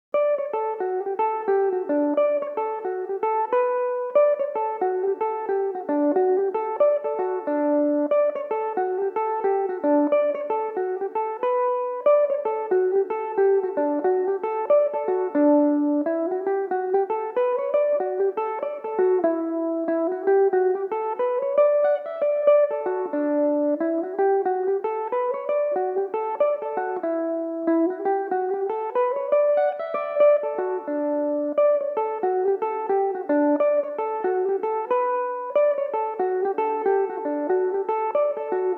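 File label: D major belmuse, irish mandolin; That's Us Now!; Belmuse EM-190 electric mandolin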